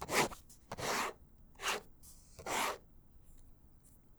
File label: Pen Cap Lines, writing; Books, Paper, Writing